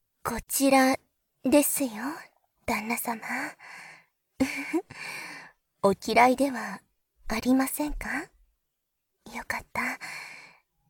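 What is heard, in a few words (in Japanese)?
耳かき後の耳舐め（バイノーラルマイク 効果音あり）, ASMR; 耳かき後の耳舐め手コキ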